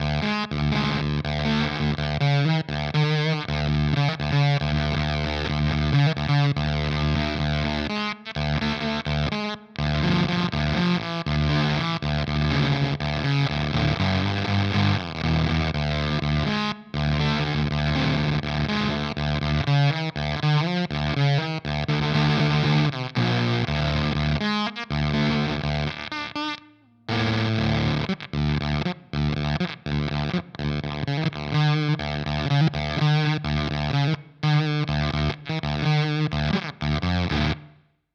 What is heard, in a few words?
Ripped Speaker Fuzz Saturated and broken; EHX